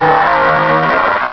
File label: exploud, cries; direct sound samples